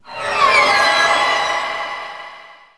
WIPE

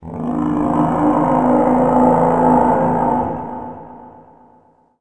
gen idle; Earth and Beyond; EA Games

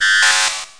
wrong; With Open Eyes - The Art Institute of Chicago

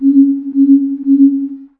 nav buoy; Earth and Beyond; EA Games

